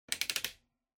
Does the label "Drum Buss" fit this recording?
No